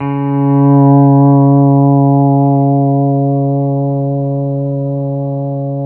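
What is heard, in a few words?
pedal steel swell C#3; PEDSTL SWELL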